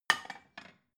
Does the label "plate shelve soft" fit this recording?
Yes